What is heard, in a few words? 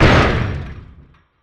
Aircrafts Cockpits Motor Broken; DCS